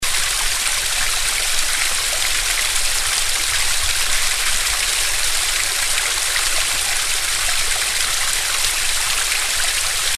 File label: Bear Hill Falls